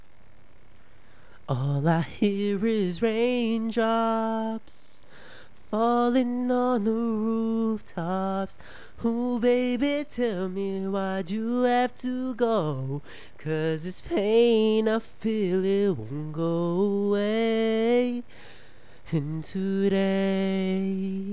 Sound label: raindrops